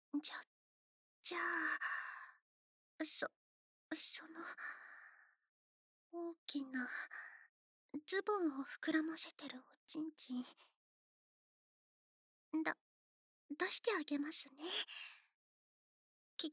おっぱい で お耳 マッサージ; ふわふわして気持ちいいのかな?

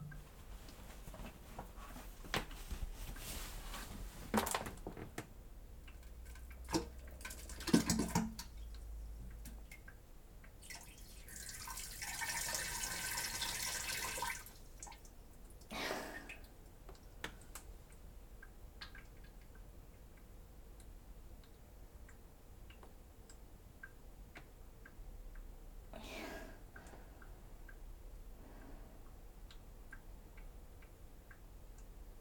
放屁放尿脱糞音声集(きばっている音も含めたロングバージョン)

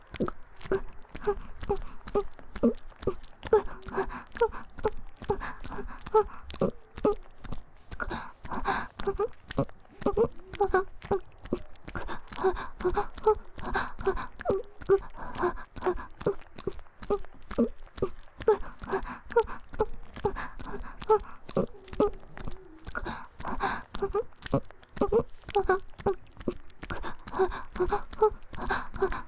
木枯らしバージョン ギシアンループ, ASMR; 宮崎弁; 田舎の古宿ン娘の夜伽

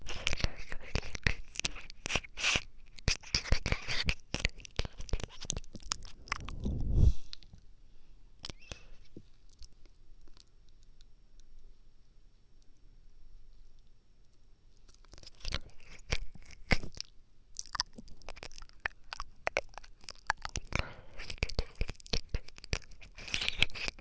耳舐め右パート

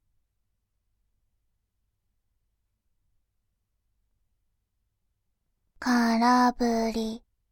空振り, バイノーラル 左, ASMR

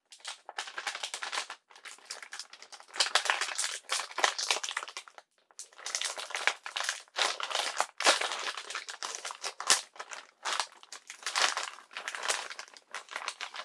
ポテト菓子の袋を開ける

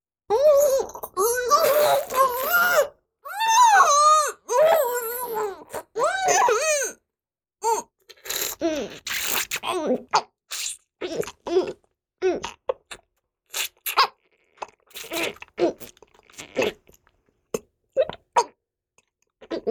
口内放尿→飲尿, ASMR; チュパ音抜粋集

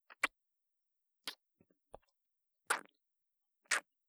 ちゅ、ちゅ, ASMR

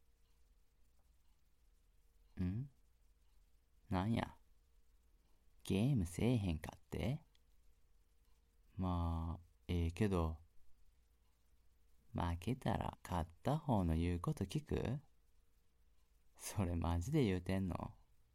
じゃれあい～耳舐め, ASMR

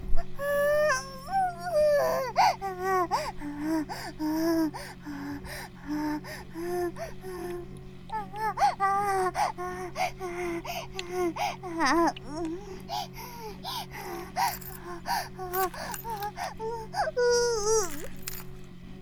バイブ放置, ASMR

